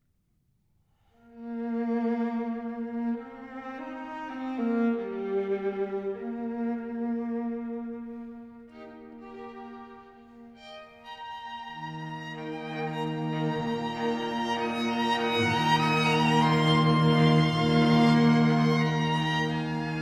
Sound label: quintet